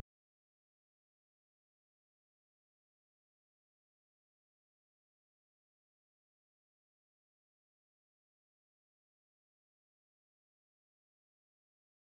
128BPM Clap Build UP; Vengeance; Essential Clubsounds Vol.5; Mainstage Kit; Root Fmin; Drums & Perc

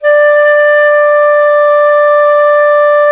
FLT, WOODWINDS; Roland - LA Composer vol. 1

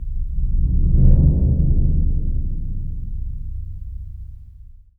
BIG BD, LRG DRUMS; Spectrasonics; Heart of Africa

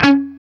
CHUG C#3 R, Guitar; East West; Steve Stevens Guitar Samples Collection

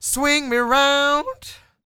SING W, Vocal Planet Blues & Soul, BLUESY; ILIO

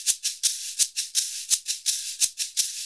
SHK, Shaker; Univers Sons; Basicussions

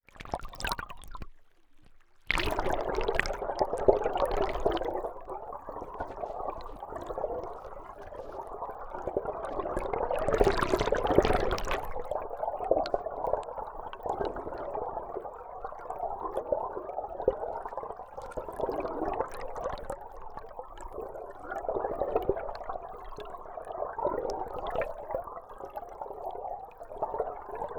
Hydrophone, Underwater, Splash; underground sounds

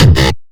bass kick

